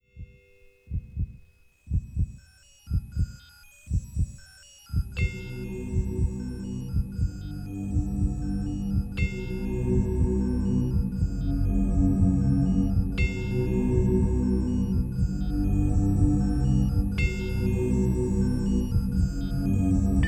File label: scifi